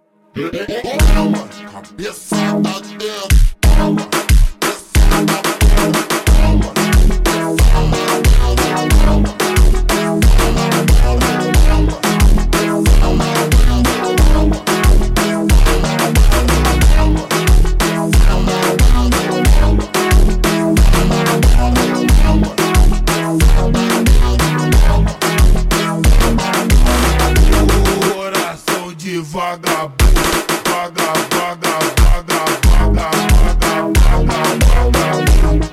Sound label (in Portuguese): Rkt Version super Slowed